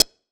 perc mod chippy

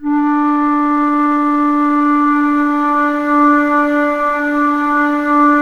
ALTO D3, ALTO FLUTE; USB Soundscan vol.23

